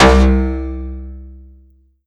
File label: Yamaha V50 Metalimba C2